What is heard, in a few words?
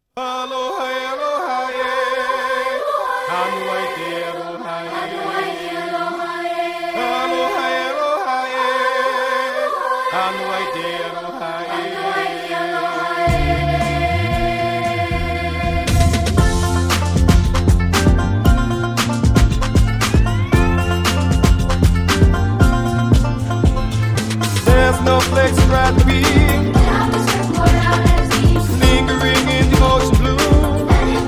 Hip Hop